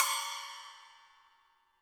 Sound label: HiHat & Crash, Roland TD-20; HiHats